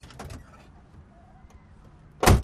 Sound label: Car Doors Oc